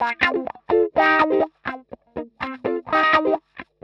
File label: 125 wah C, wahwah; PowerFX - Nu Jazz House